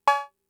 Cowbell; Fricke MFB-522

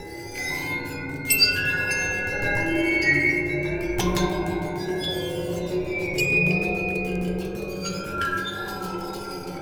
Waterphone Bounce Bow; Metal; Sonic Foundry (Sony Creative Software) - Ambient Atmospheres & Rhythms; Ambient-Metals

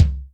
BD ROOM, Yamaha DTXpress Acoustic Kick

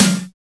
clank snare; Drums In Your FACE!

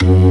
B3 Lo Fast F#1; Proteus 2000; Digital Sound Factory Proteus 2000

